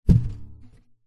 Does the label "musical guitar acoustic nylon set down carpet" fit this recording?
Yes